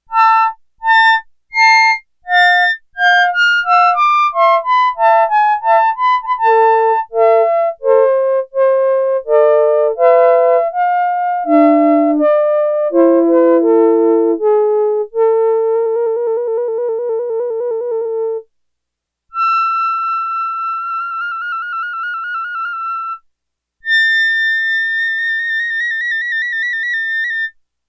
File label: Flutes